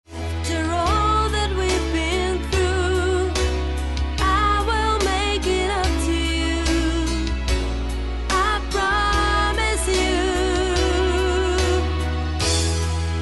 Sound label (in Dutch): stemtest mezzo sopraan